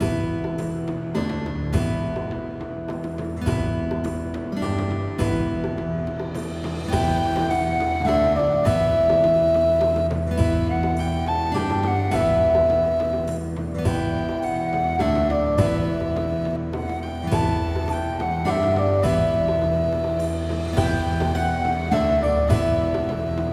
Tavern; Clunky Hero

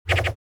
save appear

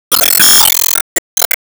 Ingame Penalty Hp0

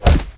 Hammer Hit; Malware

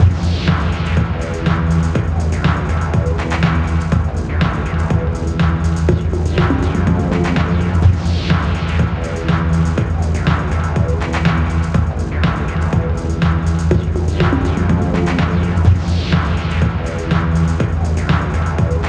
Ditty; MLB Home Run Derby; Android, iOS

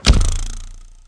Zurrrr; Neighbours From Hell Console Edition; GC, Xbox

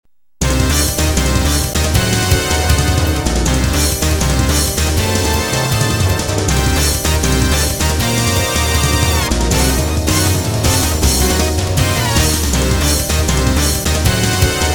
Doppler Battle; Rockman X3; PS1

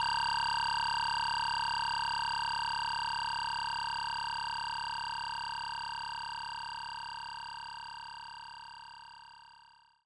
Result Count Loop

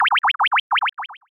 Panic; SE Manzai#06 (SE114 Man Panic)

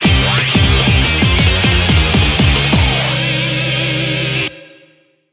JINGLE result lose; beyblade x xone

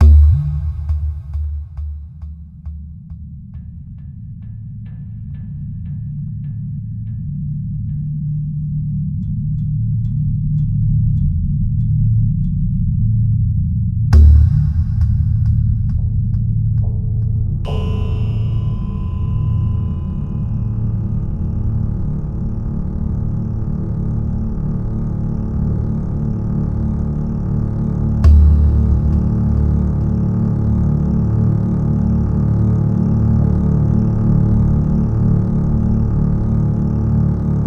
Amb Indoor; The Hobbit; PS2

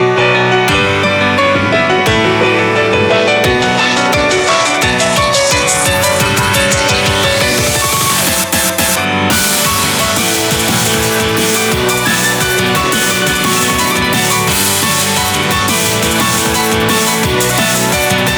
Bgm Reach

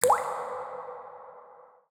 water

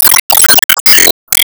Enemy Pirate Minion Hit

